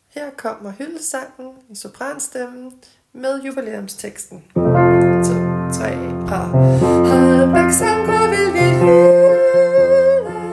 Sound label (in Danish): sopran